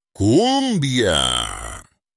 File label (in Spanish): cumbia